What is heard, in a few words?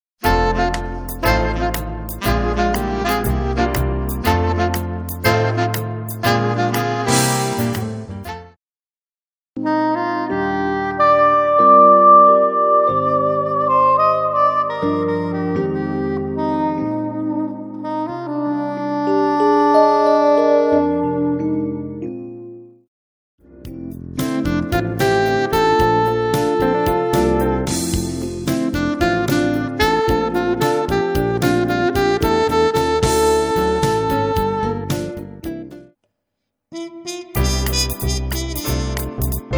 Jazz